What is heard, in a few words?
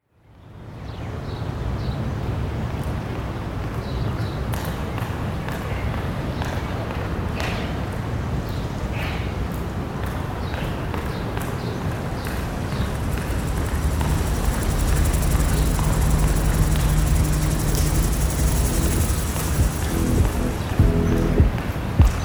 City soundscape